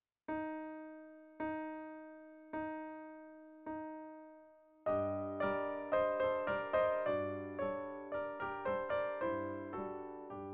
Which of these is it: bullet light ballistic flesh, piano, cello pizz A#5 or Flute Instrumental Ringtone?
piano